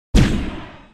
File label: gun